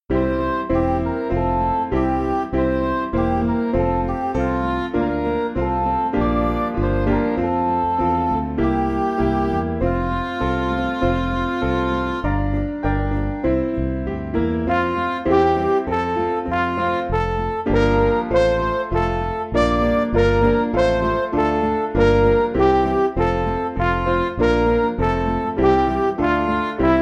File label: Midi; Glory Honour Praise; Madan